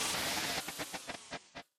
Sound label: Gun Overheat; Lethal Company